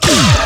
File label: laser, weapons; hl2dm; Quarzar gun